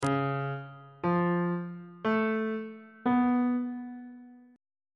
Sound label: d flat augmented 7th chord intervals on bass clef